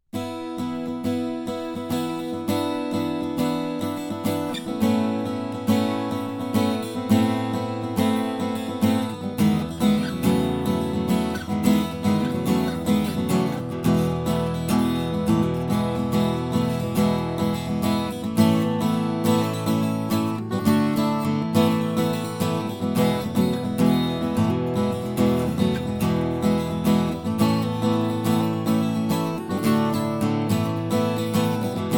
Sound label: strum, guitars